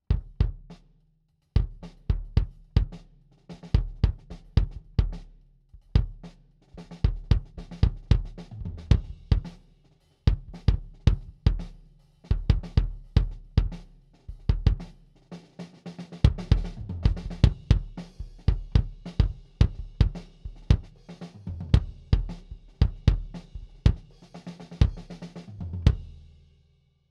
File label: kick head on; D112; far